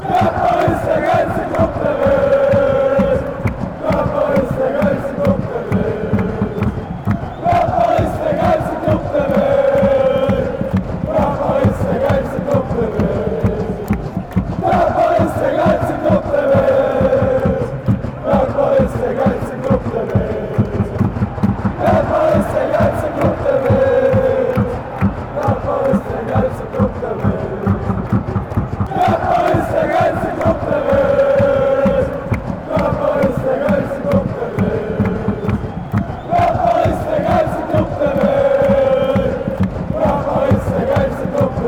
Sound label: chant; b moenchengladbach